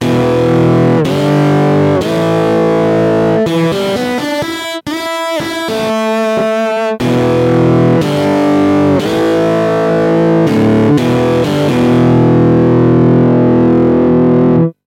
E Guitar BOSS DS1

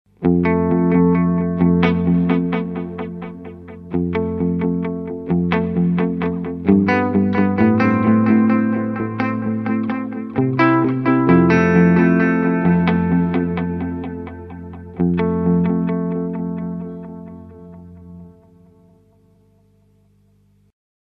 NUX Tape Core Deluxe

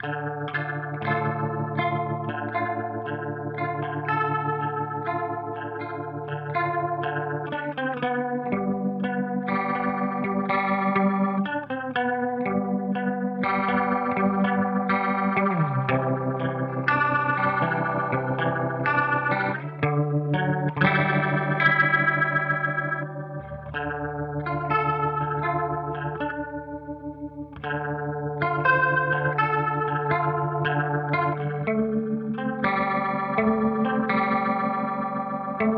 Modulation Rotary; Boss GT-1000 Core

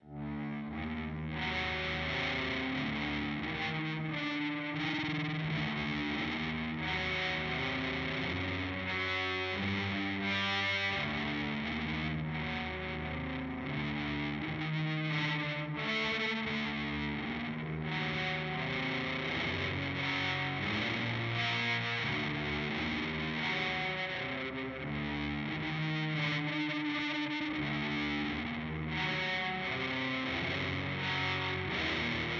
D R 3 short decay; Walrus Audio Melee

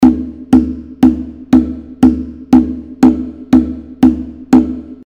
Conga Set Full

